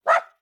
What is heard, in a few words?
Dog Shiba; unsighted